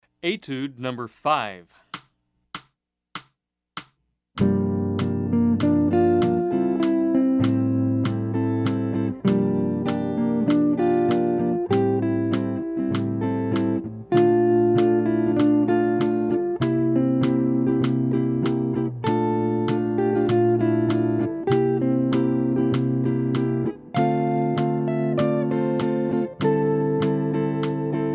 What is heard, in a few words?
Jazz